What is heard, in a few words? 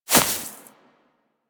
Rodent Hop Step Rear Grass, Forest Rodent Foley Footsteps; Characters; Warframe